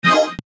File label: melodic, bass